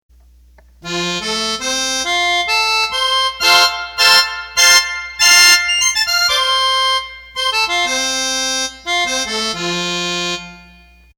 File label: Accordion